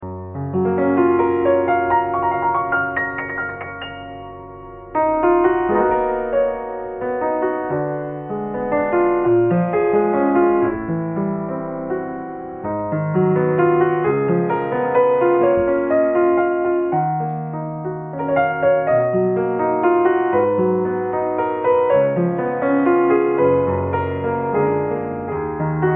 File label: Yamaha U1H, piano